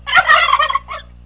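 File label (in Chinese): gongji jiaosheng, rooster crowing; jiachu jiaosheng; jiaqing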